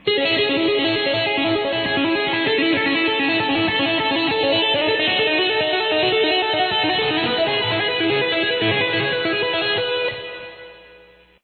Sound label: tapping, guitar